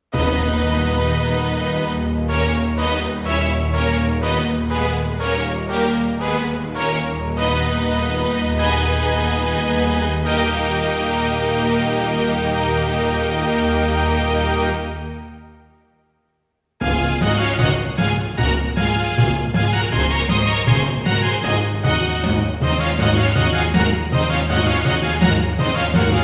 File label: Music; Theron's Quest; PC Engine